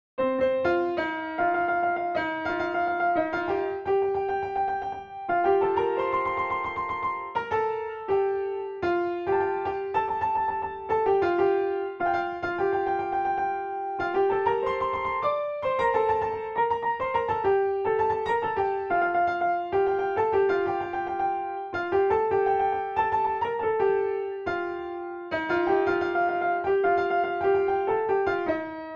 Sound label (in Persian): Bayate Ajam, piano; Rast-Panjgah; Radif Mahjoubi